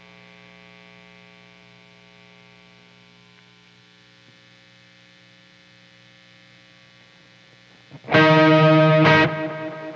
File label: guitar